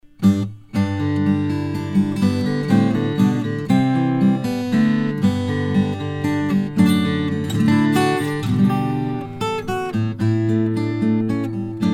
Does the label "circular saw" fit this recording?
No